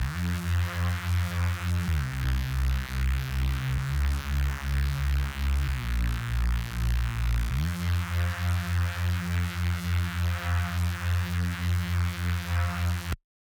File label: Reese Bass 128BPM Fm; Sick